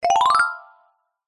multimedia notification bright metal tuned mallet ascend glissando; zapsplat